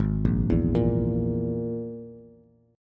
Ab Suspended 2nd Sharp 5th Ab Bass; arpeggiated; JGuitar

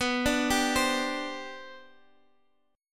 C Suspended 2nd, B, guitar chord; C2/B arpeggiated

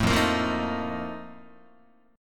F 11th F, down, Guitar; F11 strummed

